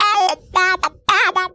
Funky Vocals; USB Soundscan vol.18; AKAI

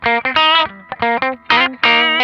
Funk Guitar; Zero G - Funk Guitar

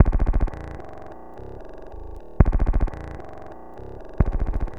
Dropped Beat, Electronic Beats; Sonic Foundry (Sony Creative Software); Myths of Technology

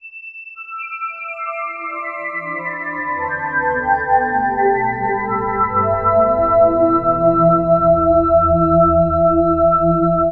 Bell; Sonic Foundry (Sony Creative Software); Infinite Soul VOL-2; Bells

